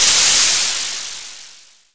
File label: Battle; pokerogue; battle anims